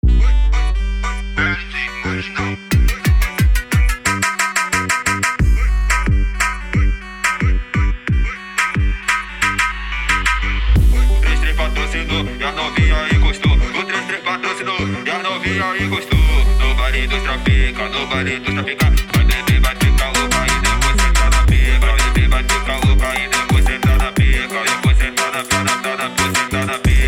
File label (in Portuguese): Medley Brega Funk